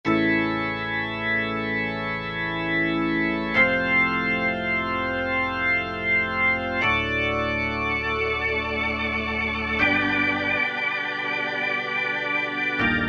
ABC Drawbar, Alesis QS8; Factory Preset examples

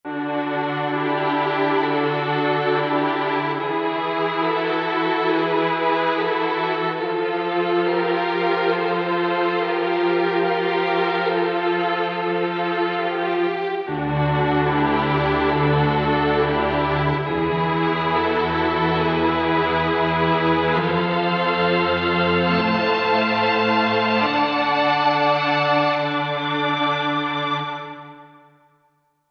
Tron Strings; Roland SR-JV80-04

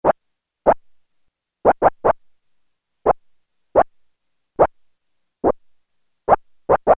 Dog, Prophet-5; Sequential Circuits Prophet-5 (Ver.3); Factory Patch; File 2 Programs

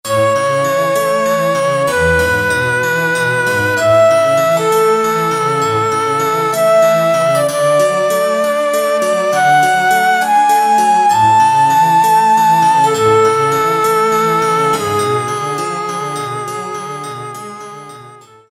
Baroque Trio, Kurzweil K2000; KEYBRDS; Baroquen Trio; Kurzweil K2000 Orchestral ROM; Orchestral ROM Supplemental Disk

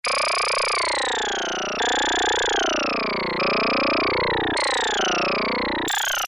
FX Sweep, Roland Juno-106; Factory Preset

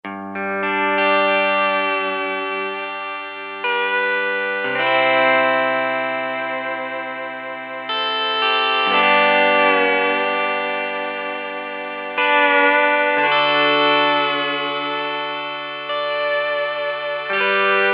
Pedal Steel, Kurzweil K2000; Kurzweil K2000 Contemporary ROM

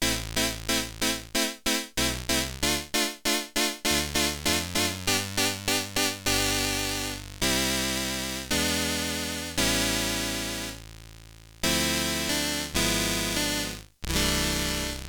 Low Clavichord with vibrato, Multivox MX-20

